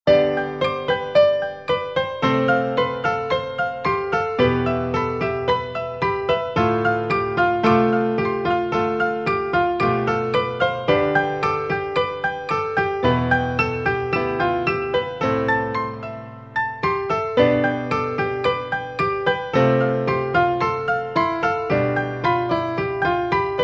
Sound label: 2 pianos High; Piano Solo